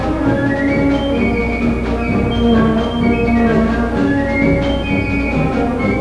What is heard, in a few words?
piccolo